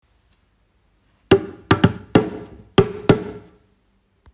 Drum Instrument

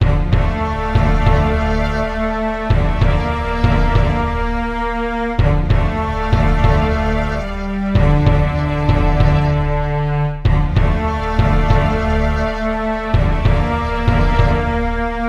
Suspense Music Loop

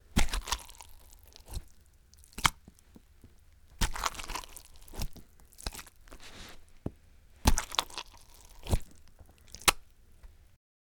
Cantaloupe Insides Dig And Splatter

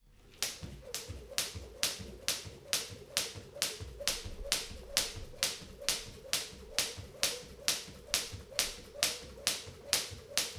Jumping rope

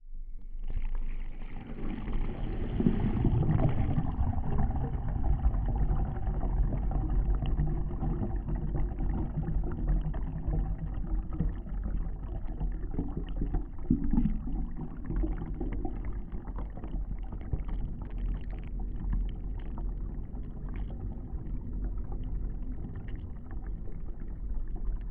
Underwater Flow

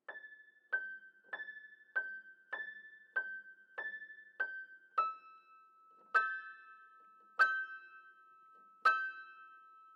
Creepy Piano

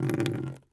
Drip On Plastic

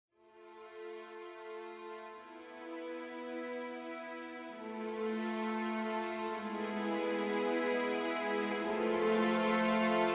Crescendo

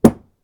Dart Thud

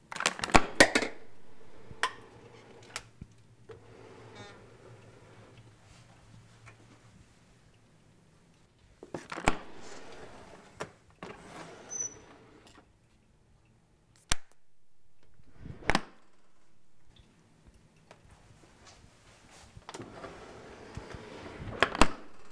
Dishwasher Door

